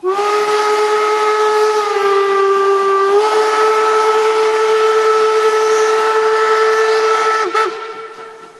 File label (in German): Hupe BR 18